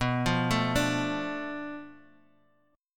B Minor 7th Flat 5th B, chord; Bm7b5; arpeggiated; JGuitar

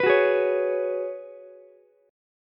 F sharp Suspended 2nd Suspended 4th, Ukulele; strummed; JGuitar

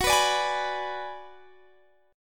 G sharp Minor 7th Flat 5th F sharp

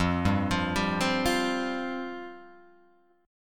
F Suspended 2nd F down Guitar Open G

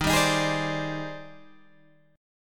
D sharp Major 13th, down, Guitar, Standard; D#M13 strummed

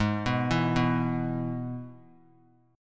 Ab Minor Sharp 5th Ab down; Abm#5 arpeggiated; JGuitar

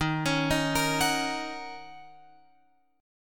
D sharp Minor 7th, Guitar, Open F; D#m7; arpeggiated; x,10,13,10,13,13; chord shape

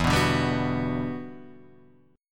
G Major 7th, E, Gmaj7/E chord; strummed; JGuitar

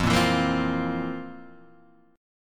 F Major 7th Suspended 4th, Guitar, Drop D

